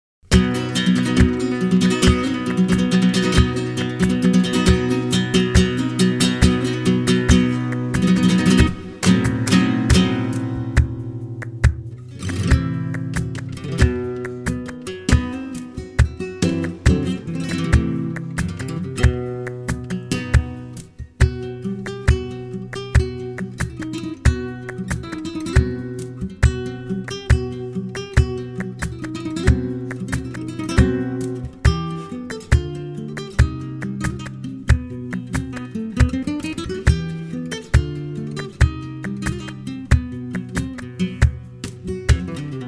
Siguiriya Guitar, compas flamenco guitar

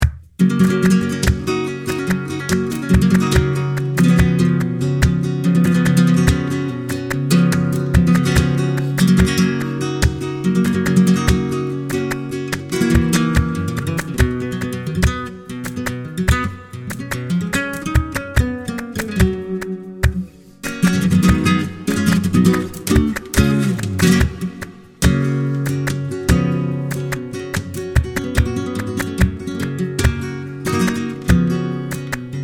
Alegria Guitar, compas flamenco guitar

